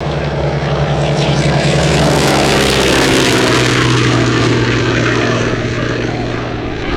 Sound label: propeller airplane passes by quickly; aircraft